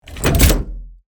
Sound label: Heavy mechanical movement